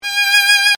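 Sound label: STR Solo Violin F3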